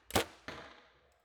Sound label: archery foley release and impact; SoundsCrate